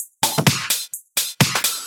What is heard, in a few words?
House loops 128BPM; Vengeance Samples